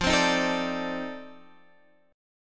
F 13th, Guitar, Half Step Down; chord

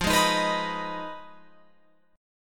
F Minor 7th Flat 5th, Guitar, Open F; Fm7b5; strummed

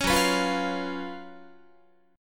Gb Major 7th Suspended 4th Gb Guitar

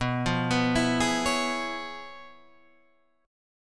Db 7th Sharp 9th B, chord shape

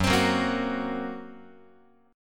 F sharp Major Flat 5th F sharp Guitar; F#Mb5 strummed

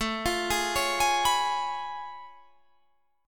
A Augmented Major 9th A down

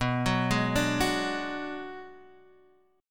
B Minor 6th B, Guitar Half Step Down

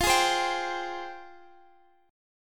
F Minor Add 9th F; strummed; guitar; JGuitar

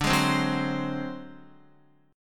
D 7th Flat 5th D, Guitar; D7b5 strummed; JGuitar

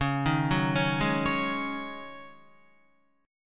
Db Suspended 2nd Suspended 4th, Guitar; arpeggiated; 4F,4A,5C,5G,5C,6E; Dbsus2sus4; 8,6,6,6,8,9

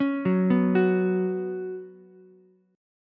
B Suspended 2nd B down Ukulele; arpeggiated; JGuitar

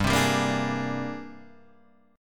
G sharp Major Sharp 11th G, chord

